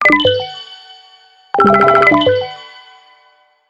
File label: Envelop, ringtones; HTC